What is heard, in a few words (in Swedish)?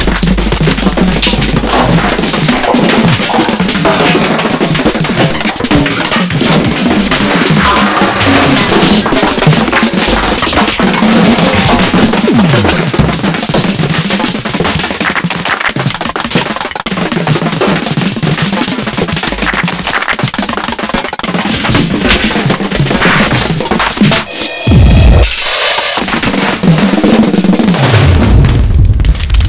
trumsolo